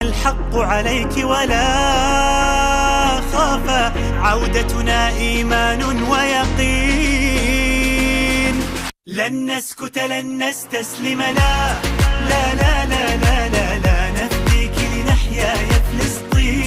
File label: Attitude Ringtone